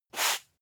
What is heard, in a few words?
broom sweep single